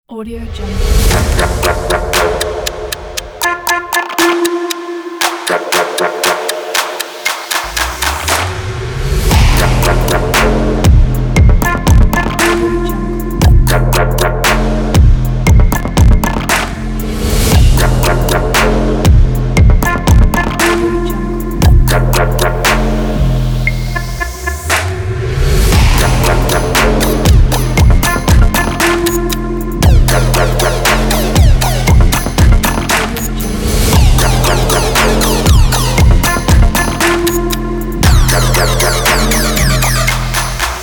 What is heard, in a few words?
percussion for fashion